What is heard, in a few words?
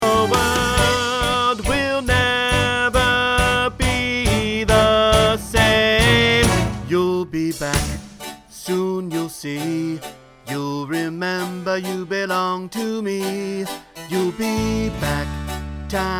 bass